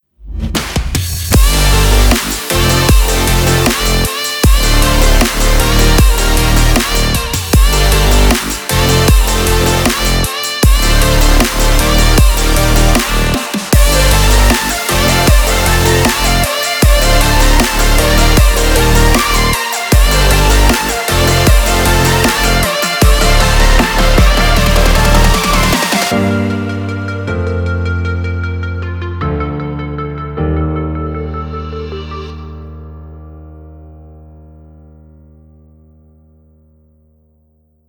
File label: future bass